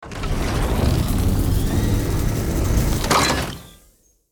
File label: Sci fi door power energy open or close